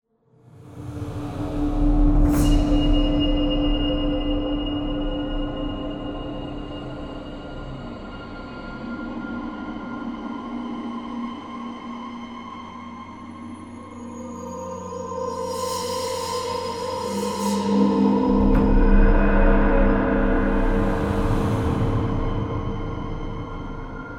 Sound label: Space Atmosphere; Space Atmosphere 3